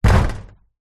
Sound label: impact metal corrugated loose